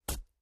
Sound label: Flesh Stab, gore stabs; gore stabs & eat